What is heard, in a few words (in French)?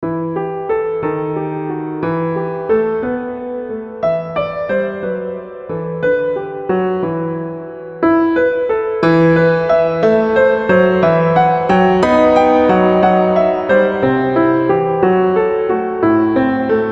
Etude pour piano; Etude No1 pour piano; Lacrymosa Industry